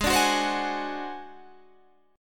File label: Ab 7th Flat 5th, chord; 16,17,16,17,19,16; strummed